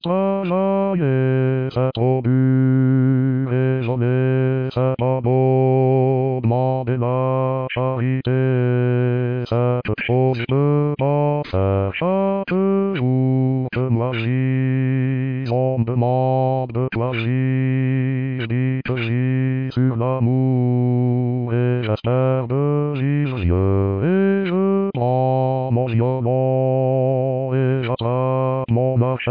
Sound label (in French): Basse